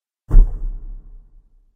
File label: deep thump